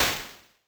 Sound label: death; Incantation